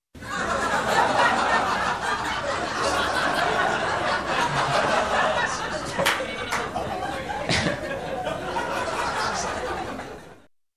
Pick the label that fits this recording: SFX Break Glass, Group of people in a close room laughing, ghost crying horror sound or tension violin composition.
Group of people in a close room laughing